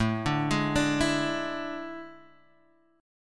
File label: A Major 7th Suspended 4th, Guitar, Half Step Down